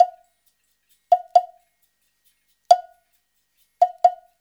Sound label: 089 FREES, Modern Percussion Loops